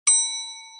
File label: notify